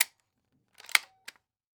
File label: Weapon Gun Shotgun 12 Gauge Benelli Automatic Safety On; AdobeCC